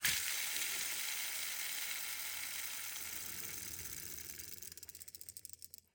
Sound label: Rollerskates, old fashioned, all metal, wooden wheels, swipe, spinning, slow to stop, friction; Eiravaein Works; Vaeyan IV